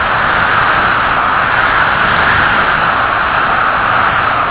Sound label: Wind Loop; GLScene; AirBlast